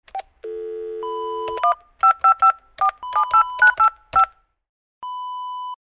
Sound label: telephone speaker dial